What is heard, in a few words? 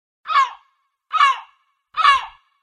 seagull